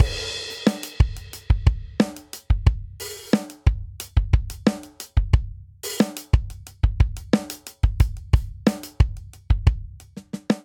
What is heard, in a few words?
Stadium Rock Verse 90 bpm; 4 4; MIDI Clips; Ableton; Factory Packs; Drum Booth